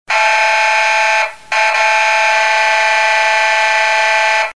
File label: Air Horn Sound Siren